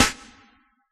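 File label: jungle kit snare; Giga Samples Collection; Dr 202 Kit 1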